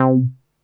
NASTY B, BASSES

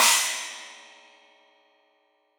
China, Crash, China Cymbal; Deeply Sampled Drums; Cymbals Stereo

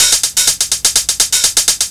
hum hats etc, Deep House 125bpm

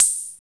CR-78, ANALOG; Sound & Vision - Gigapack I

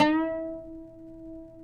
PLK OUD, Roland LA Composer

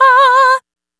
Stigmata Dry, vocal samples; Sonic Foundry (Sony Creative Software) - Pocket Diva World Class Vocal Samples; Op-Erratic Loops

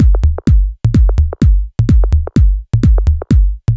Plasticbag C# 127bpm, House Drumloops; 90 s Sample CDs; Zero-G House Fabrik Drumloops; ZG Drumloops

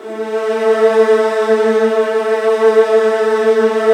A1, Virtuoso Strings

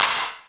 CLAP HOU, Dance Mega Drums; Best Service

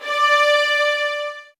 SOFT VIOLINS

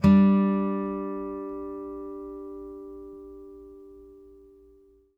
5ths G3, Blues Guitar; ILIO; Fretworks

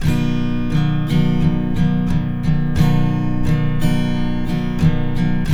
Guitars; Spectrasonics; Hans Zimmer Guitars VOL-1

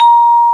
vib up, MALLETS; FAIRLIGHT CMI IIX

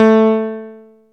PIANO A3; Best Service Alpha Dance vol.2

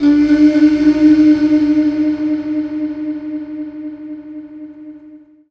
Sitar

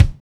value kick

